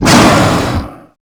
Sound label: dragon shout short